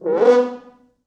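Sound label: French Horns; East West; Quantum Leap Horns Sax; 4 FrHorns FXMOD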